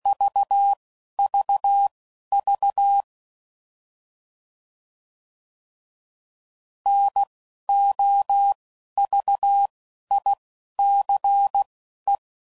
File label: CW Code Groups 10WPM